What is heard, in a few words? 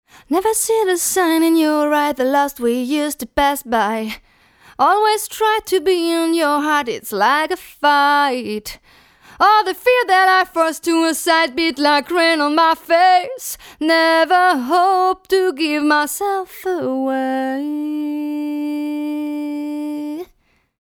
mxl v67